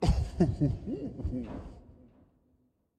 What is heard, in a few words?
Toxic Mask Small Laugh